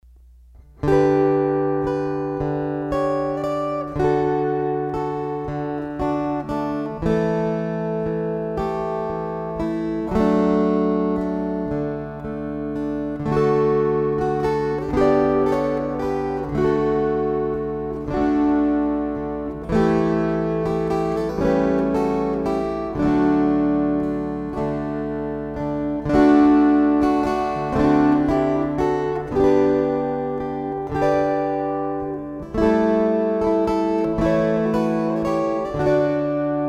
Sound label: String Choir